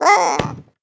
Paper Bag Fall; Talking Tom Cat 2

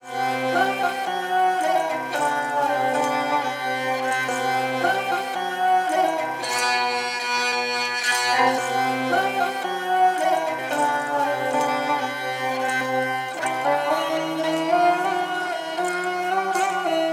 Sarangi; raji an ancient epic

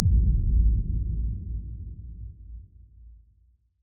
Peeking Noticed

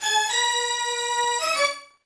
Beat

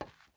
glass step

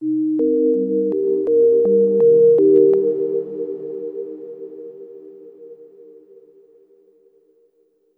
Vive Resistance Tone

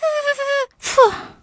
Caramel Miss Sound; Jolly Battle; Android

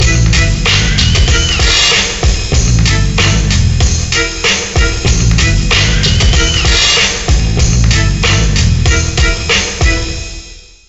Ditty; NBA ShootOut 2003; ps1; ps2

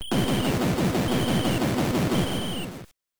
Base Stolen BGM

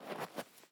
snow jump; teardown; ps5 windows xbox series xs

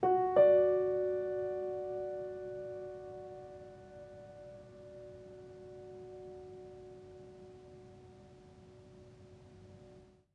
Music Level Fight Stinger; Florence

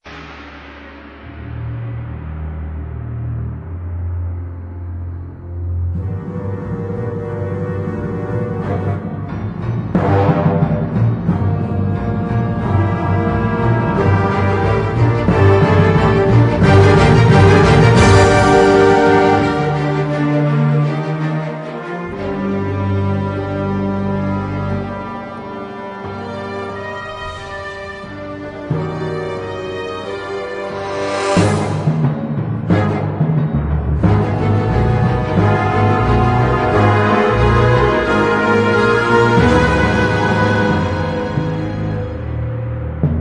Action; Kaptain Brawe: A Brawe New World

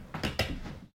Room Store Wood Creak Sml

